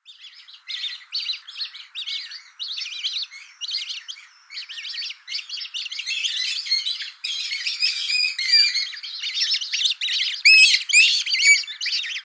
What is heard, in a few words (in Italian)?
Folaga giovane